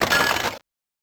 Terminal Powerup Bought

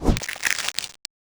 Powerup Eating Trigger

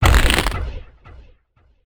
Sound Powerup Tarot Deck Trigger

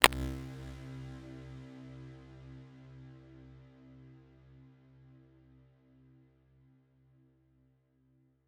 Sound Menu Start

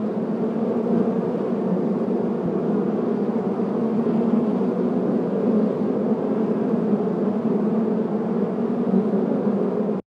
Drawer Flies

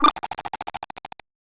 Terminal Login

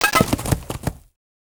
Powerup Horse Shoe Trigger

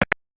Sound Menu Select

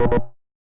Sound Dialogue Next

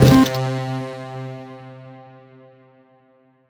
Sound Reward Box Pickup

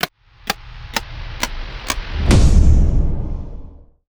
Sound Slot Machine Long Streak End Anticipation

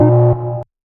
Death Countdown Alarm

